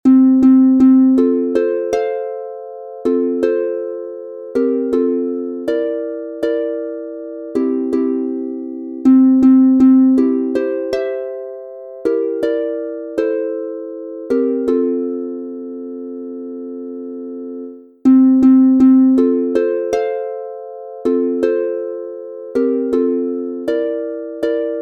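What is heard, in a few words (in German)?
F Dur 3 stimmig, Harfe; Instr. Stuecke zur Advents u. Weihnachtszeit; Siedlinger Arie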